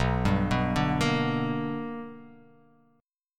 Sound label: B Minor Major 7th Sharp 5th, chord